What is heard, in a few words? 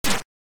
Title AY; Hawk Storm